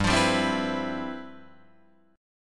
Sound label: G 13th G Guitar Drop D, chord